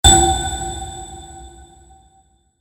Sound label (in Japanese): ガチャ インパクト